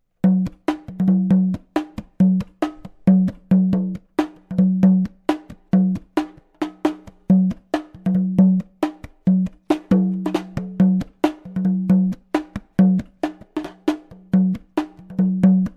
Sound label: Bongo